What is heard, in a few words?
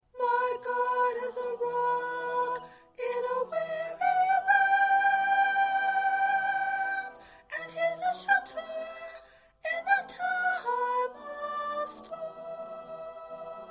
1st Soprano, choir parts